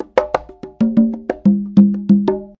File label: 093 bongos; DRUM LOOPS and BREAKS; Bazillions of Bongos 090 to 131 bpm